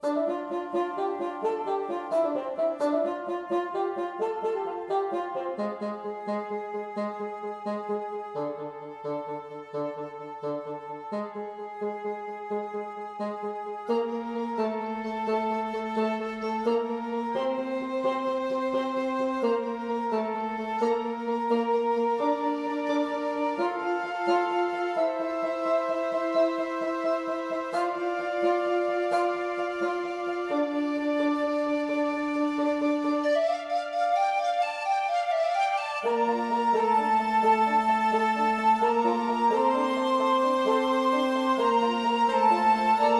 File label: Music Magic Defense Woodwind; 7 Mages